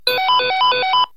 Transceiver

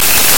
Baby Laugh

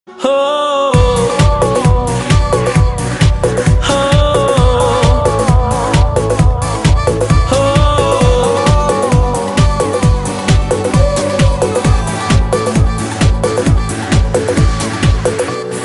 Tune; Blood Money

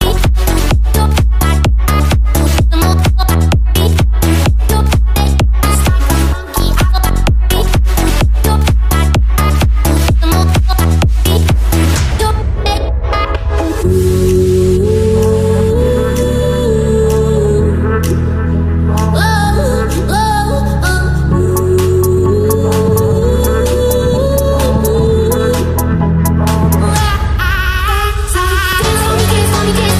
5 tones

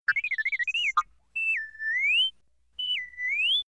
R2D2

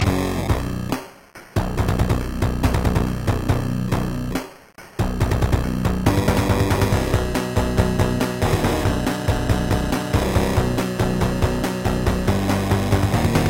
16 Bit

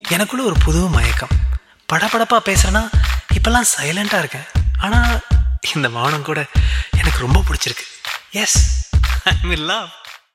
Tamil Ring Tone

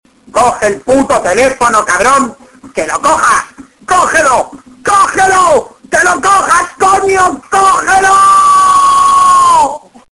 Ring Tone Mexicano

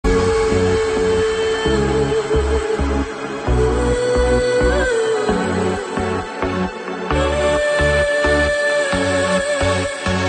Romantic Trance

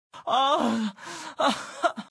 cry; yoosung

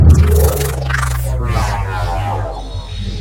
Transformer Sound

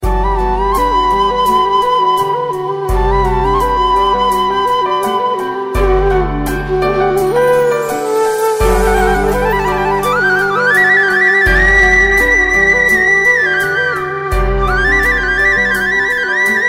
Bansuri

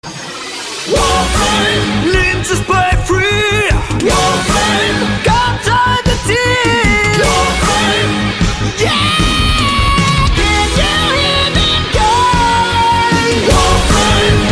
80s song; Warframe

